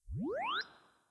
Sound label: UI Rise Up Long; blue archive